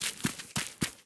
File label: Cat Black Walk